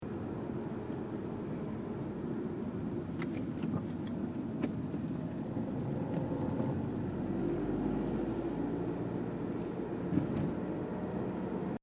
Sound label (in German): rechts; avensis